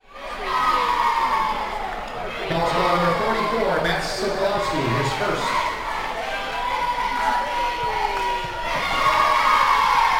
Basketball Cheerleaders Chanting with Announcer Speaking